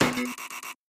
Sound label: hammer hit wrong answer